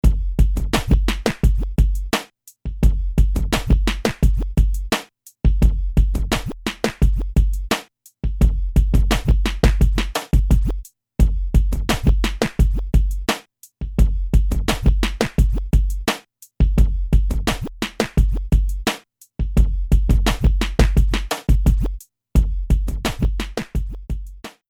beat

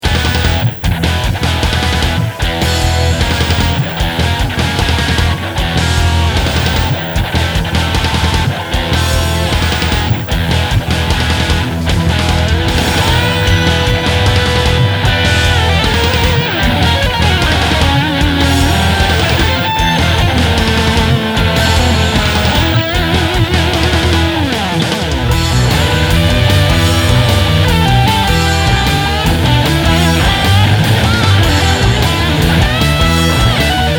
metal single

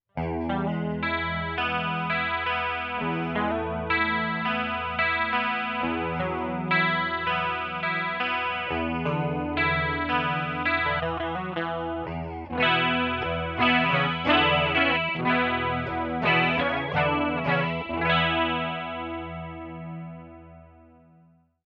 clean; jc

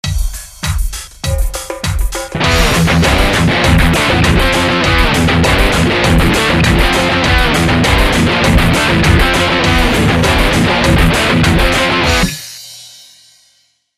drive; gs10

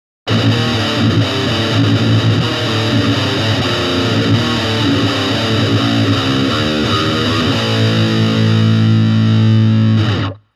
mstack; cube 15

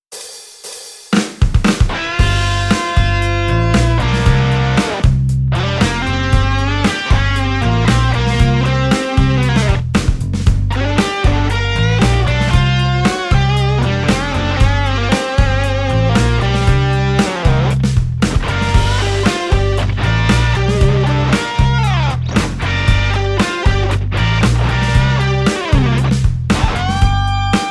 5th ps gate

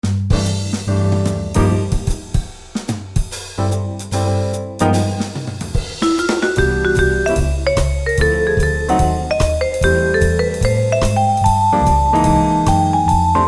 jazz combo

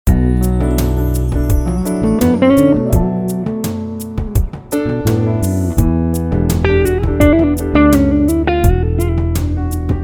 jazz neck pu

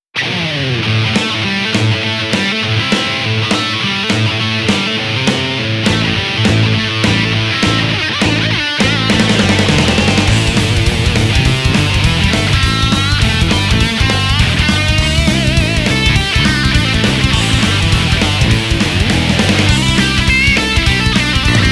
lead

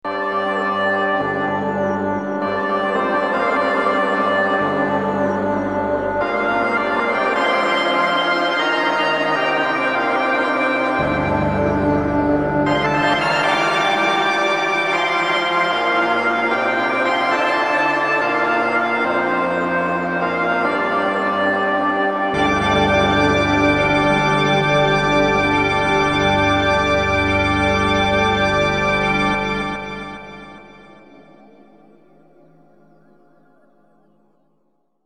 vc 1 sorcerers organ

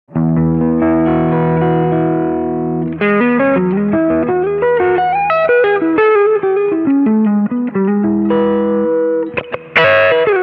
brit combo reverb; Micro Cube red

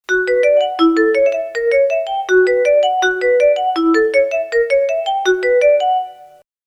xylophone

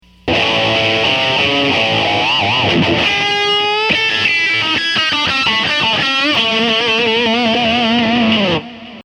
vintage overdrive

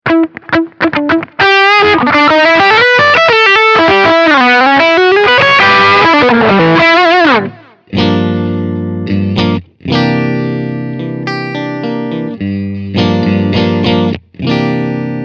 sound check

